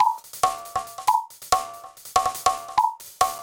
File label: Drum Fx Loop, Electronica; Drums & Percussion; FX Kits; Sonic Foundry (Sony Creative Software) - Electronic Point Blank